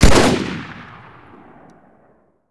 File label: battle rifle, fire; weapons